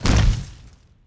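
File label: tree hit large; objects; Star Wars Obi-Wan; XBOX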